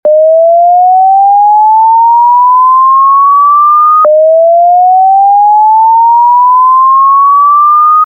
Whoop

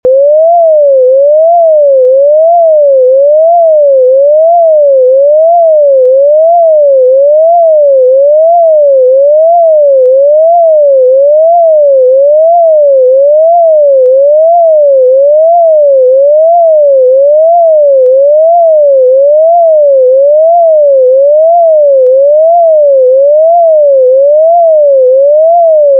Shelter

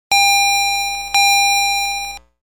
Double Variable Gong

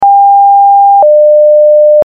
Hi Lo

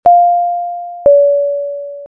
Ding-Dong

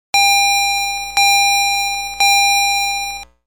Triple Variable Gong

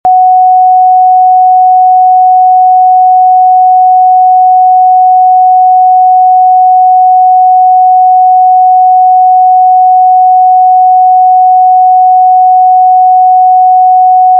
Steady

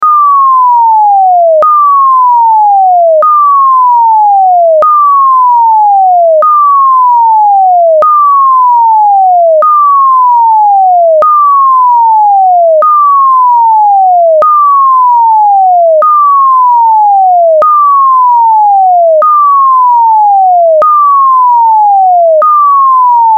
Yeow

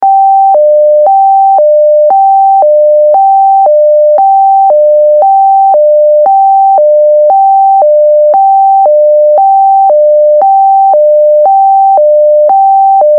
Hi Lo

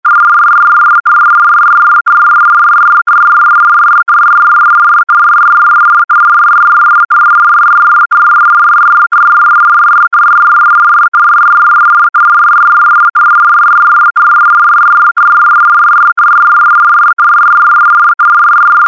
Birdie

